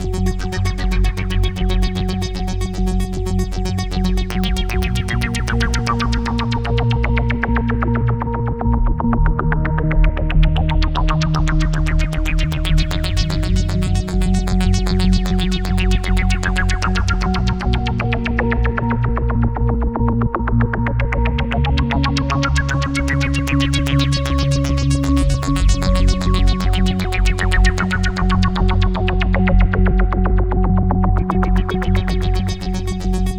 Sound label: Arpeggio Line, Synths